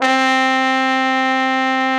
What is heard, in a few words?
BRA TROMBO, BRA TROMBONE; Sound & Vision - Gigapack II CD 1